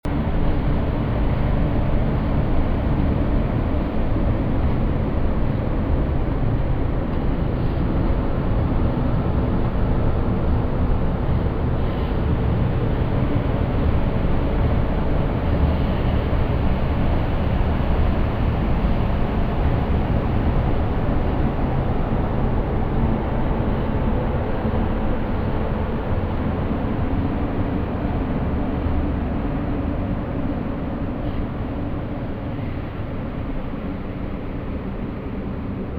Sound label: the roar